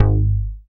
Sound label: BAS MKS-50, Roland